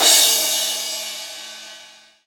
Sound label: crash; osu